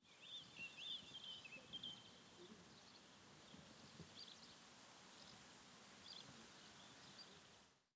Friche Industrielle Arbre Vent Oiseaux; Rhinau

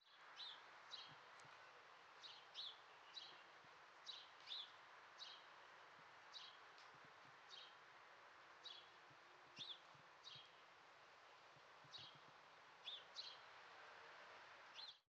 Petite Ceinture Oiseaux Vent

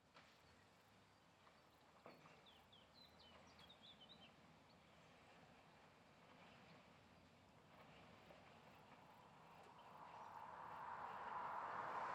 Friche Scierie Voitures Rail Scierie Pépillement; Châtenois

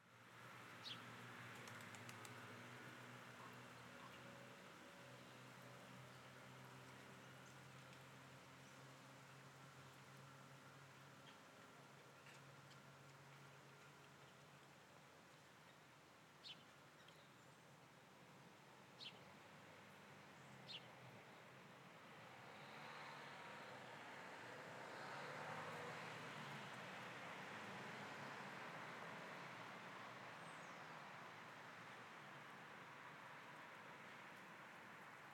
Vent Oiseaux Voitures Mobylette; Petite Ceinture

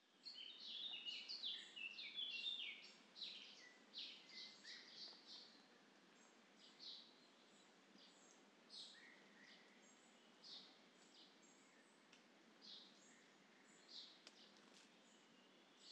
Très Ancienne Carrière, Oiseaux Long Et Brindilles

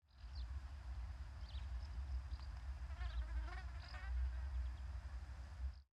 Basse Voiture Et Pépillement Et Abeille, Friche Industrielle Rhinau

